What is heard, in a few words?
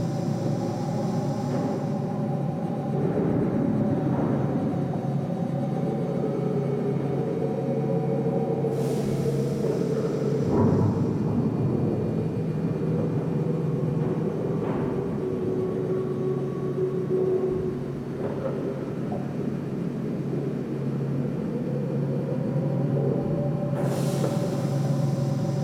Galleon Generic Amb; Warframe; Ambience; Grineer Galleon